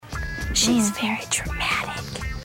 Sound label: dramatic; "She's very dramatic."